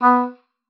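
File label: B3, oboe d'amore; Xsample VOL-7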